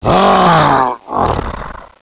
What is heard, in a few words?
zombie die